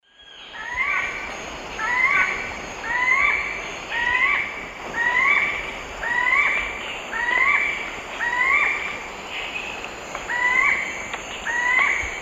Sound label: Celeus elegans, calls; S. America; Eastern Lowlands